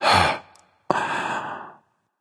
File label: nv breath, vox; smite; voicepack; vulcan